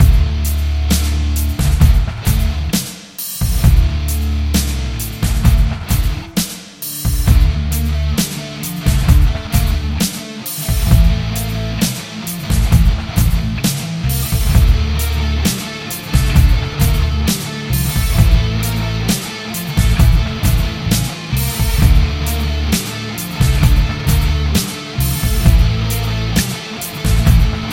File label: quoi google instrumental